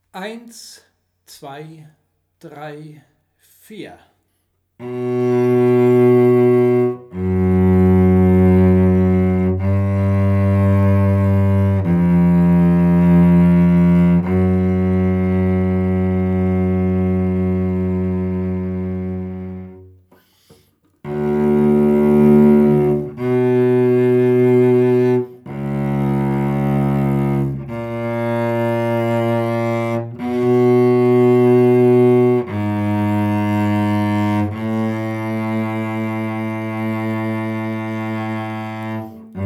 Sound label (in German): Vivaldi Satz 2 Stimme E; Burbach